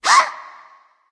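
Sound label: nonverbal surprise; smite; ratatoskr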